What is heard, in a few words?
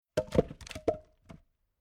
Domestic Large Log Dropped Onto Log Pile Wood Impact And Roll; Miscellaneous Impact